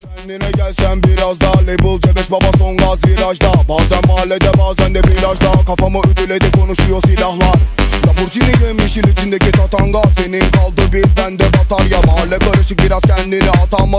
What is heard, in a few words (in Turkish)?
Rap HipHop Zil Sesleri